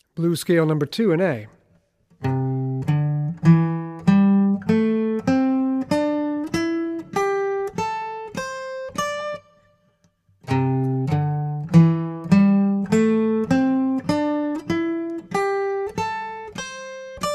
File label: Blues Scale in A; Blues Scale #2 and Chops in A